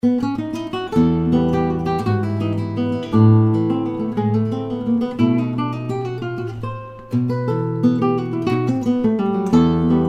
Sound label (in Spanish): vals venezolano